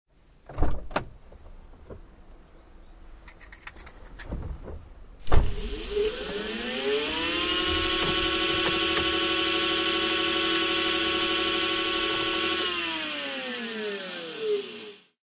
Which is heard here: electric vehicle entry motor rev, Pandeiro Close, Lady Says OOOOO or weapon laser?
electric vehicle entry motor rev